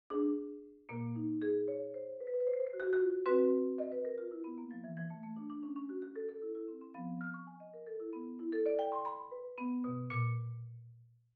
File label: marimba; tapspace; sibelius sounds essentials